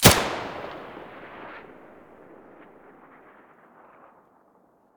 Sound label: muzzle mono